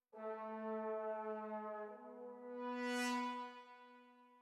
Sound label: Brass